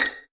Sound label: vipiz A#5, Violins pizzicato